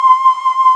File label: SYN K-2000, Roland